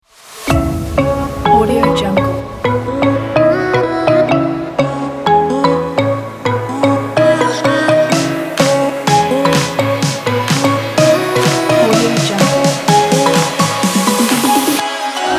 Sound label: party pop music